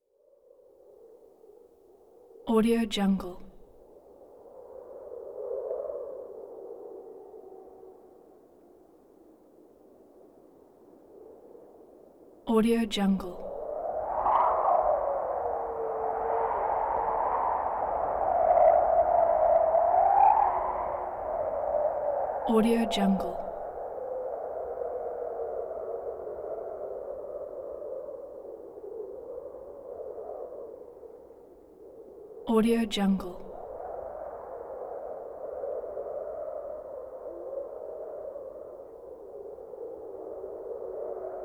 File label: wolf wind eerie howling with lufts processed